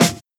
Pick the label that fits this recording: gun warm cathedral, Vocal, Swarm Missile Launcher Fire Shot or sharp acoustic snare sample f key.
sharp acoustic snare sample f key